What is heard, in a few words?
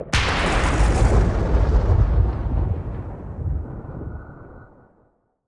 sfx amb vfx thunderclap; System VFX